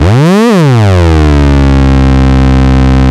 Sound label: MEMORYMOOG